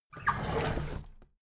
drawer big squeak open heavy

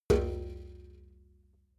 metal bucket hit soft

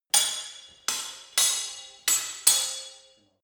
sword hit fast sword freestyle

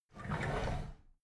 drawer big squeak open regular

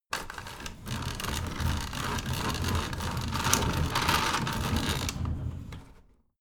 window roller shutter open medium

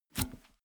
bmx bike release bar heavy

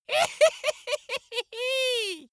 dwarf laugh gleeful

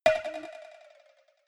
cowbell move muted soft

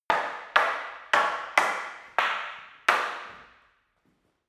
staff wood fighting sequence

